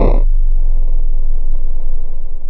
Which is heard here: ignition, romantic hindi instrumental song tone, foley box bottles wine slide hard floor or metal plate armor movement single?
ignition